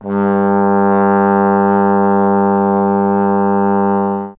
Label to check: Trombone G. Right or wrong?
Right